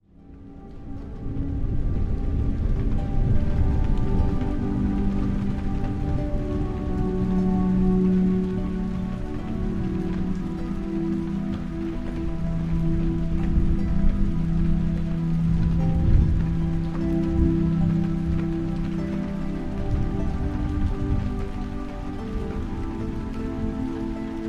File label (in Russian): Звуки природы для малышей